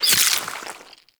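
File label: Infested Splitter Gore Spew Projectile By; Warframe; Grey Strain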